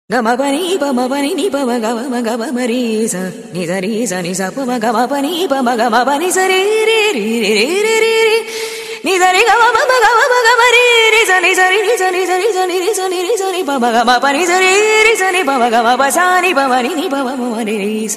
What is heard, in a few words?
hindu devotional ringtone; bollywood ringtones